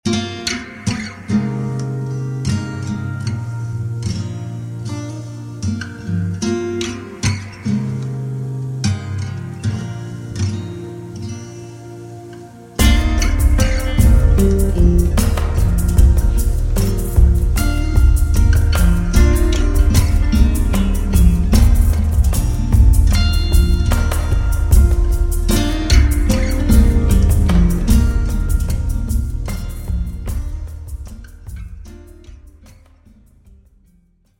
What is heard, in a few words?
Ethno Pop